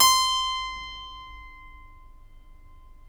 C 2 CLAV; Keyboards Acoustic; AKAI; USB Soundscan vol.17

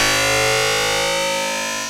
D+B

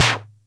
Music Percussion Computer Tom, Drum Machines

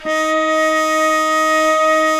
WND FLT MT, Flute & Mute

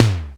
LO TOM; R50III; Crypton - DrumBase5000

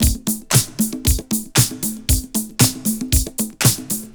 116, LOOPS; Best Service; Hyper Dance; 90 s Sample CDs

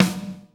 SNARE, ACOUSTIC KIT; Greytsounds VOL-1

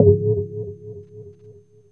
ANALOG BELL; ANALOG BELLS; Ambient; Zero-G; Ambient 1

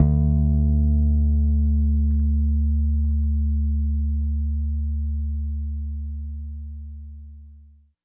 Soul Bass Ending, 70s Analog Funk; Sonic Foundry (Sony Creative Software); What it Is!

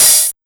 150 UK HH OPN, Ragga & Dub Reggae; USB Soundscan vol.27; AKAI

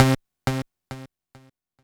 C3, Synth Line; 90 s Sample CDs; NiceBeats; SynthLine VOL-1

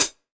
HH, R-8 drums; EdgeSounds; Drum Mashines

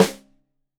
Rim Shot Stereo, drums; Deeply Sampled Drums

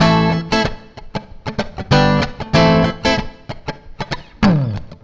CLEAN, CLEAN GTR

